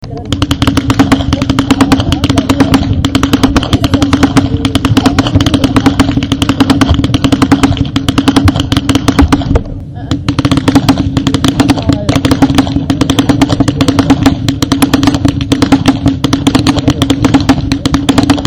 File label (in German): schnalzen; ainring